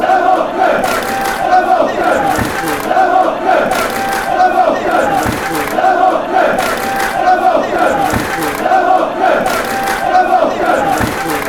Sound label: claps fan chants; morecambe